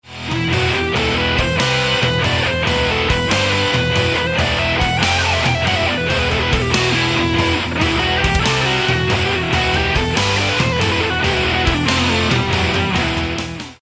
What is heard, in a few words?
metal guitar scales natural minor